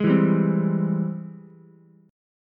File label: Ab Augmented Major 7th Ab Ukulele; strummed; JGuitar